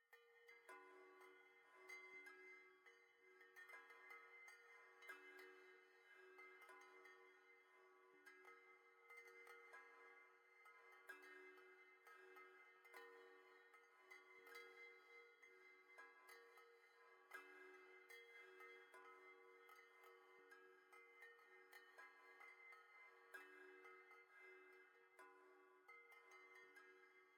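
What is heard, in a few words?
Ambience Ocean Exterior Bells; Music Bank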